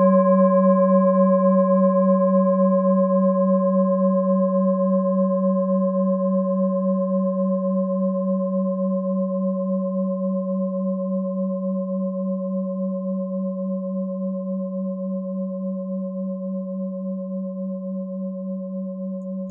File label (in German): klangschale set